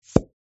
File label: popup close; gardenbloom